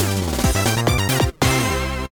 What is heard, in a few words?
Jingle You Win!!; bomberman 2; nintendo ds